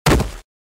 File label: body fall sound effect; body falling